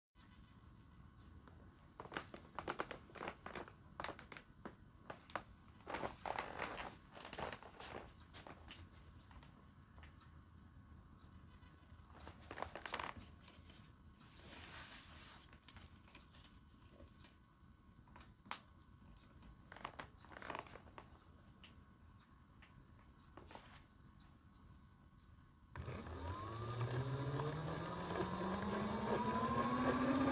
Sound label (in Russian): zvuk motora s gutim silikonom, fatbike